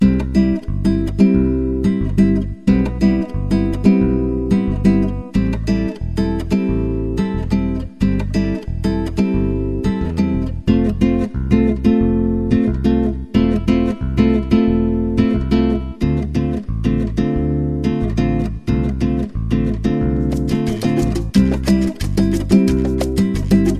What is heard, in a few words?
chords